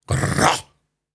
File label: Grunts and Chants, Viking Vocal